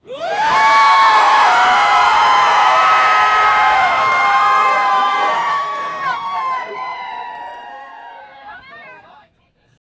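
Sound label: in a large stadium setting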